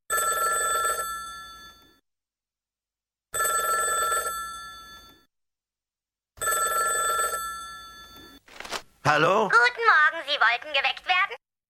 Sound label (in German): Wecker; Simpsons